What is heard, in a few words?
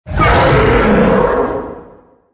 Dreaming Drake Corrupted Attack